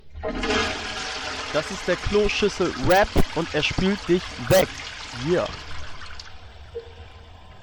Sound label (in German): Kloschüssel Rap